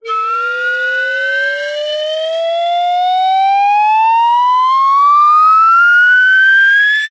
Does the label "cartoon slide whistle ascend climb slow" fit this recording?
Yes